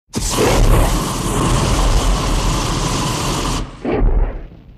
fire blast

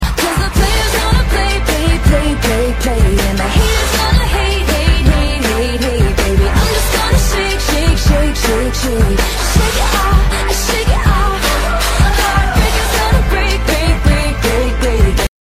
walk up music

